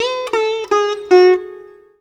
SITAR LINE; SITAR RIFFS2

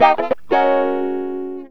GTR, chank; Zero-G; Funky Elements

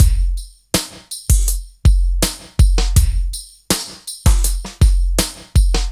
162, breaks; M8

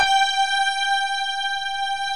ANALOG STRING; OMI - Universe of Sounds

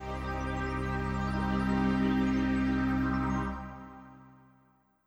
String C1; 132bpm; Big Fish Audio - Old Skool House; OSH Construction Kits; OSH Marshmellow

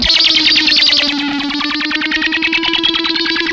ZAP FX, Funk Guitar; 90 s Sample CDs; Zero G

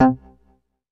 BASS, Synth Bass; BA1 Synth-Basses; Sound & Vision - Gigapack I CD 2

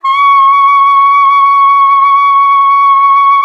C#6, saxophone; Best Service ProSamples vol.42 - Session Instruments

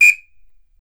WHISTLE, Brazil Drum Perc; E-MU Producer Series Vol. 7 – Old World Instruments

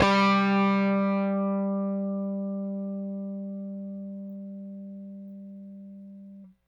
Rickenbacker Bass; Airwindows

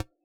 click, Yamaha DJX Rock Kit

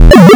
GAME ALARM; Big Beat Samples & More; AKAI; ALARM KIT

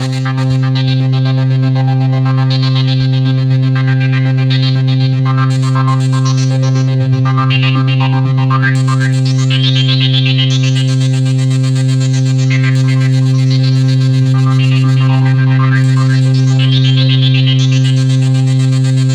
Roland JV 2080, poly saw

